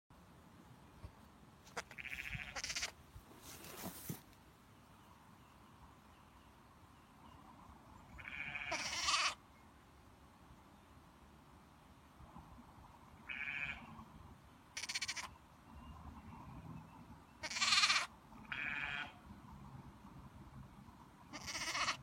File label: Cute baby lamb bleating - Baby lamb makes baaing sounds; Karacsonyi tortenetek